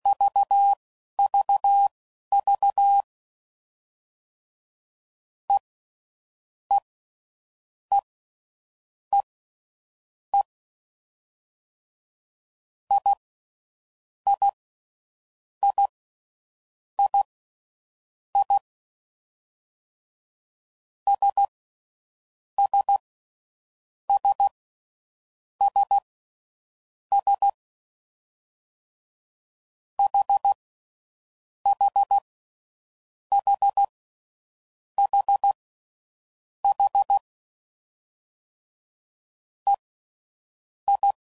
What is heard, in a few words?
All Dits, CW Code Groups 10WPM; Morse Code; Short Runs